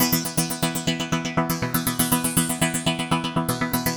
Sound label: Syn Arp; Dr. Fink's Funk Factory